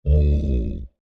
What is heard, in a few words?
Creature Large Moan Dissappointed; Monsters; Horror